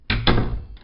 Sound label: logged off; TeamTalk v5.17 ubuntu22; client sounds Old